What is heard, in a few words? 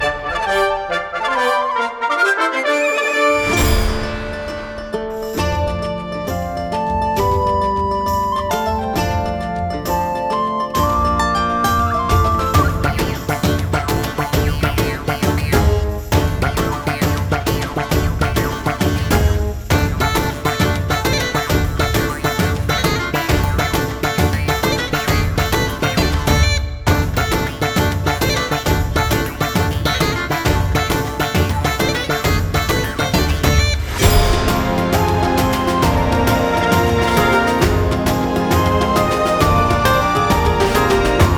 menu music; Big Crown: Showdown; PS4, Switch, Windows, Xbox One